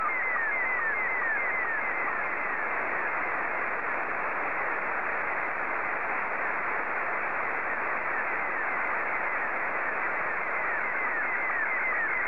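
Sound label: SSTV, Scottie 2; JA3OEN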